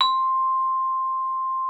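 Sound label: vibraphone C4; Xsample VOL-4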